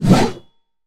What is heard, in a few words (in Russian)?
vzmah po vozduhu zheleznoj palkoj